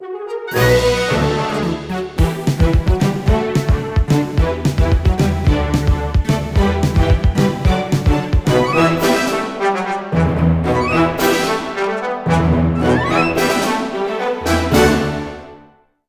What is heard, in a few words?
Scallywag Imp Legendary Music